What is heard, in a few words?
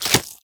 Nunchuck Equip; Warframe; Infested; weapons